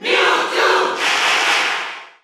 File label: Cheer Italian; Mewtwo; SSBU